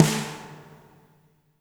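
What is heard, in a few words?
reverb snare right; Spectrasonics; Burning Grooves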